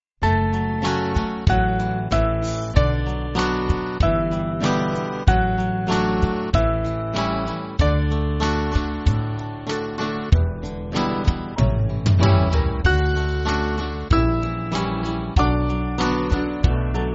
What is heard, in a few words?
Band; Lead Me Lord; Wesley